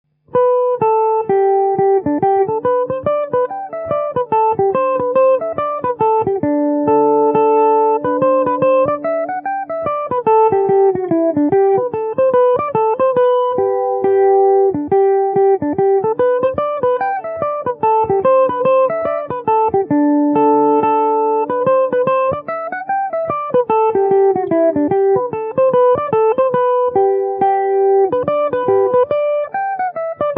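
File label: G major, Irish mandolin; miss shepherds hp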